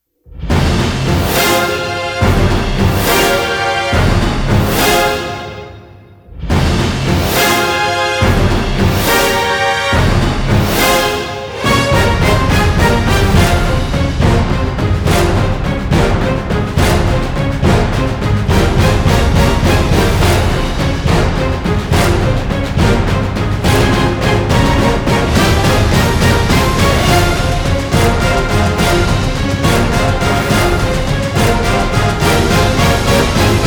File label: no choir